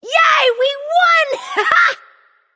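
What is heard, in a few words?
yay, female; smite; voicepack; hera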